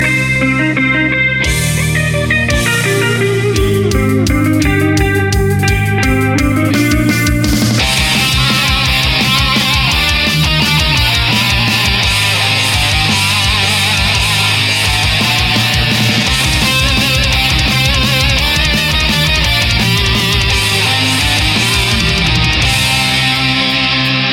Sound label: hard rock; legend of zelda ocarina of time